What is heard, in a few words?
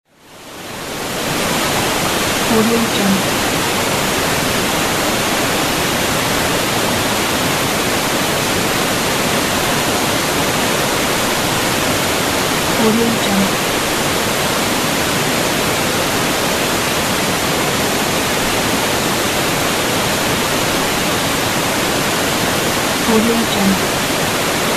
waterfall